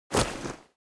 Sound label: foley medieval step leather light; smartsoundfx